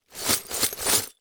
Broken Glass Plastic Tub Rattles Three Times; Foley

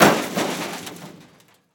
Crash Car Concrete Dropping On Car With Debris; crashes; AdobeCC

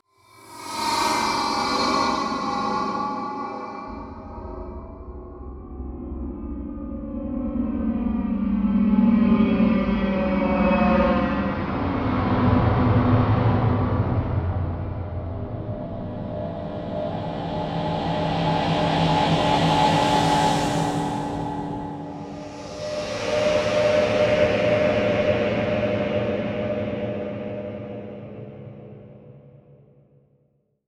Slow Spaced; Adobe; Production Element; Imaging

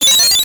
Title Transition Data Transmission Computer; Production Element Title Transition

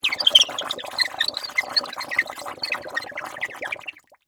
Underwater Water Flute Under Water

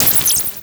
Multimedia Internet CD-Rom Flash Hi-Tech

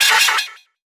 Animation Electronic; Multimedia Internet CD-Rom Flash

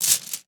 Sports Pole Plastic Tent Poles Dropping On Dirt

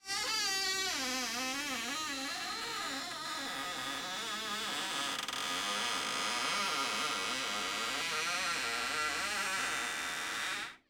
Door Creak Pantry Slowly; household

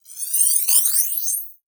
Production Element Title Transition Liquid

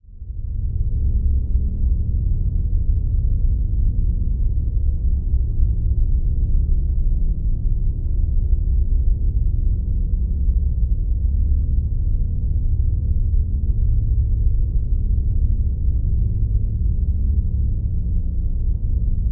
Drone Atonal Steady Constant Heavy Rumble; Production Element Imaging; AdobeCC

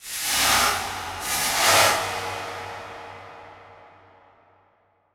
Transition Metallic Blast

